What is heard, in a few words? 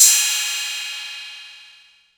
cymbal crash; Playdate SDK; MIDI Player